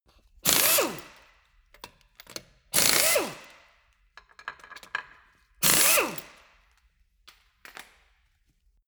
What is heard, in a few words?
impact wrench screw sequence